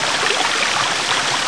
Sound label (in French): Cascade